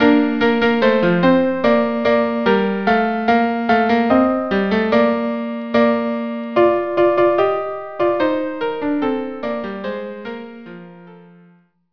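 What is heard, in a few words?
viola viola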